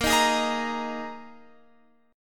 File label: A sharp 7th Suspended 4th A sharp, Guitar, Open F; A#7sus4; strummed; x,17,15,15,18,17